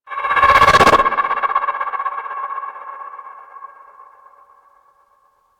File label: Focus Operator Cast End; Warframe